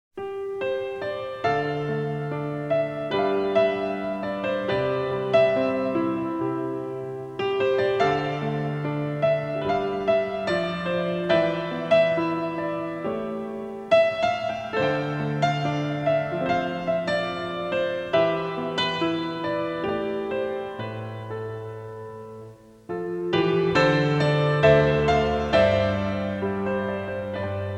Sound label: theme of grandia (piano version)